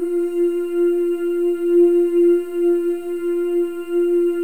FEM, Symphony of Voices; Spectrasonics

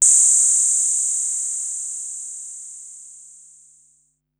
Noise High, Korg Wavestation-SR Drum Kit

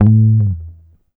GTR TRIK, Jazzistic; Univers Sons

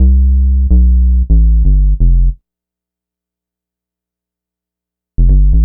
Synth Bass

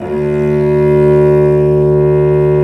STR VC P, Vc Arco Solo, Arco p nv

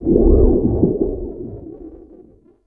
FX, Drum & Bass, 150 BPM; Ueberschall; Drum & Bass Resonance

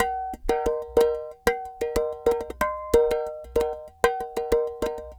clay pot, world percussion

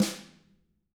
TAM 3 HIT, Drums; TAMAKIT

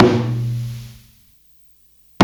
Rack Tom, Psychedelic Drums

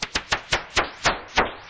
loop, techno atmos

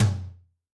Room Flr, Yamaha DTXpress-II Tom

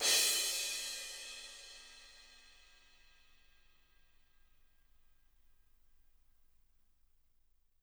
crash, crashes

